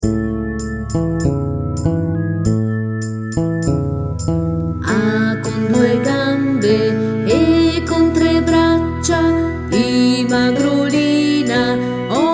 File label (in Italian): filastrocca vocali voce